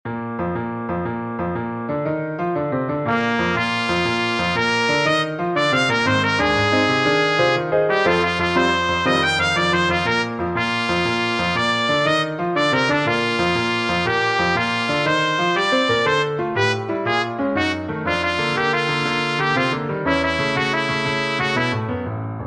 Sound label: trumpet and piano; three preludes